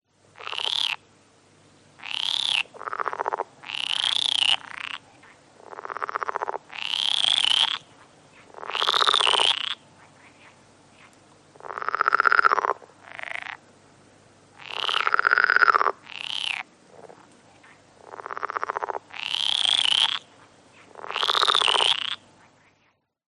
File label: Frog; audio hero